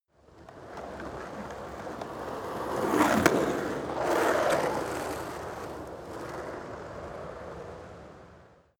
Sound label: skateboard double drive by